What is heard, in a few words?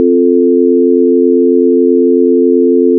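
Chord F major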